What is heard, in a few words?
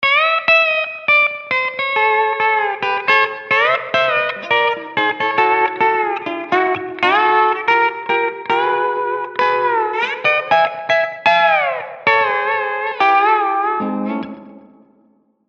Lap Swing